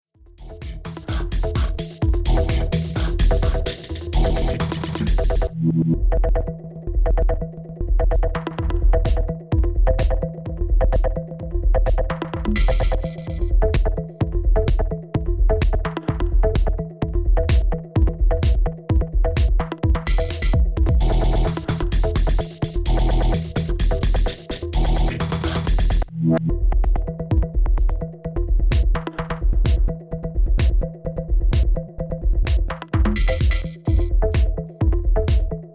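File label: Denon DJ X1850 Beatbreak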